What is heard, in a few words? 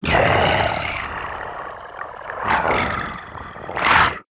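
idle, zombie male; nmr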